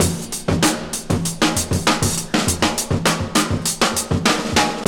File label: Loop, Roland; Sound & Vision - Gigapack I